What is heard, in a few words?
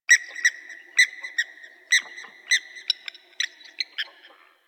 Night Tree Critters; Warframe; Ambience; Eidolon Plains; One Offs